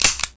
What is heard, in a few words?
colt empty, weapons; dod